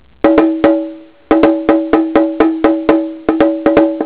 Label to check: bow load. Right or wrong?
Wrong